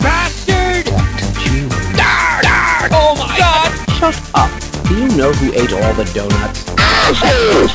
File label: radio music